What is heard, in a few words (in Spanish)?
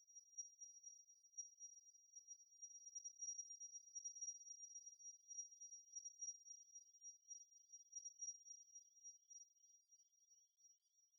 gorriones; berna; choir of species